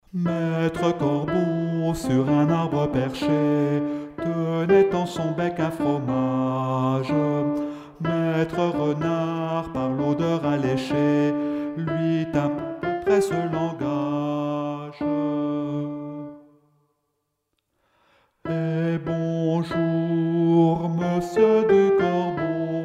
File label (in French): alto; LE CORBEAU ET LE RENARD (alto chanté)